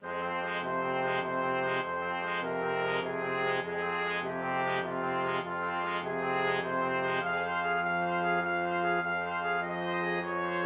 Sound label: spanish romance, flexible brass ensemble 4 players